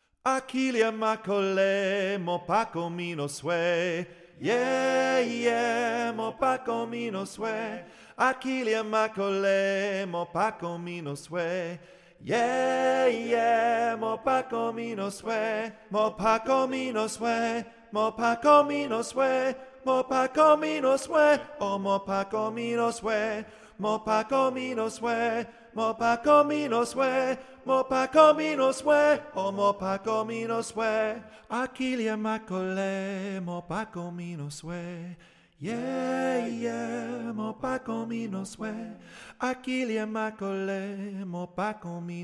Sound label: tenor